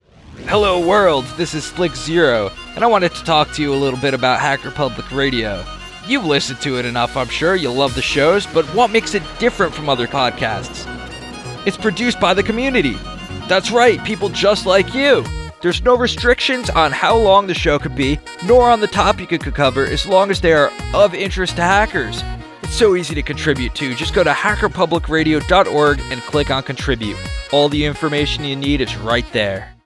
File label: promo sax; hpr contributor